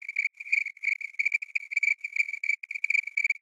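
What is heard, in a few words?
Cicada Loop Reverse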